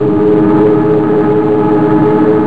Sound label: Death Spiral; BOMBER III™ (DEMO)